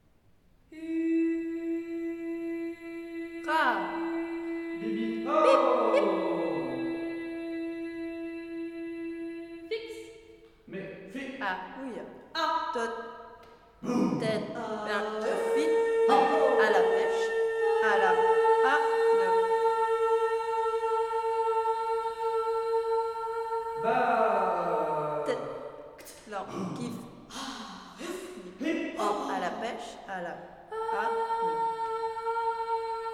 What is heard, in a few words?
Quintet; amphi morpho